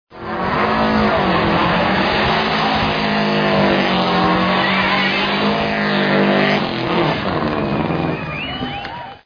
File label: Rally